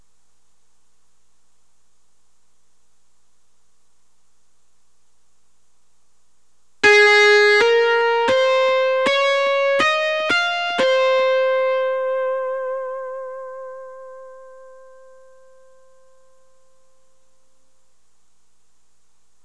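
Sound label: Guitar Lead Line Recording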